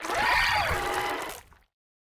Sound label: Jumble Guts Clotted Roar